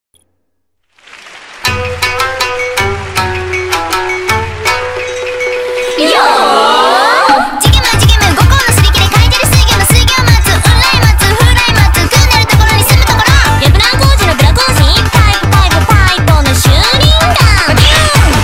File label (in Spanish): musica; armagedon2